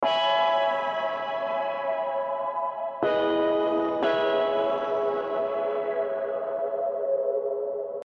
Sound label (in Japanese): モザモザパッド; HEAVYOCITY; MOSAIC TAPE